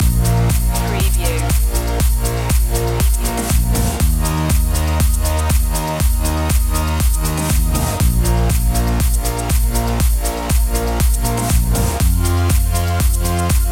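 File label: Electronica Electropop underscore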